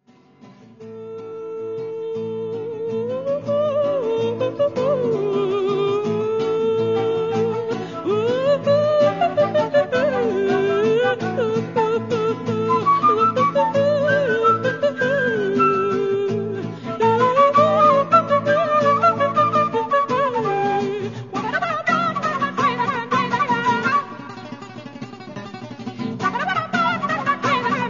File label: Samba Rachenitsa